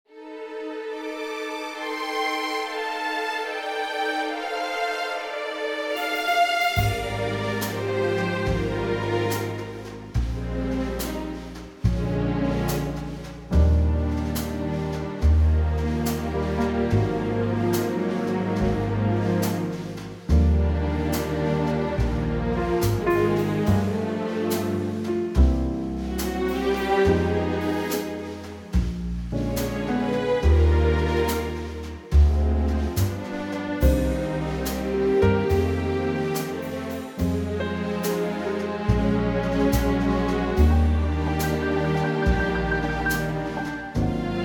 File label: orchestra Eb